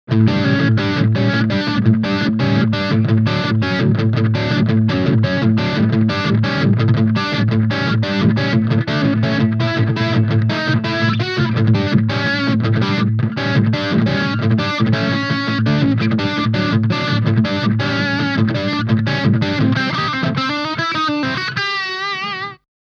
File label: Crunch Riff EQ 12 Uhr